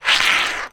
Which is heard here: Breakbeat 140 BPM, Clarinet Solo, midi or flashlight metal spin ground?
flashlight metal spin ground